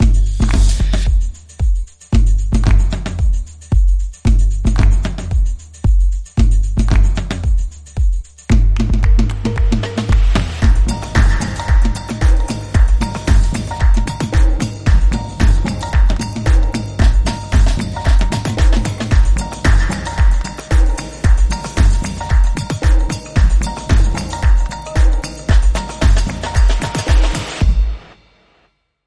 Beat Up Drums Only

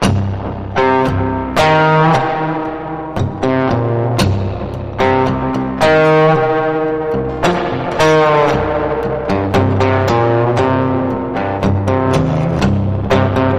Blues

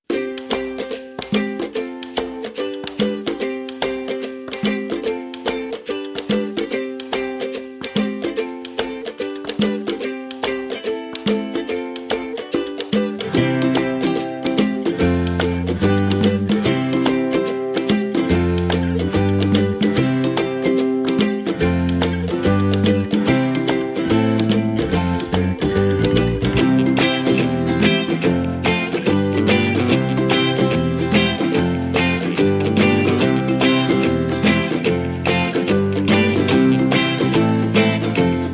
Light No Drums

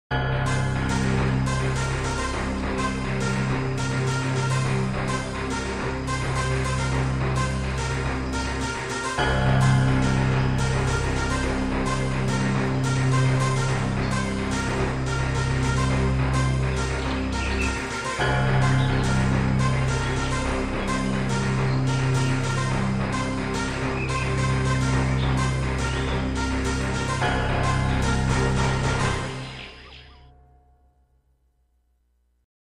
High Energy Action

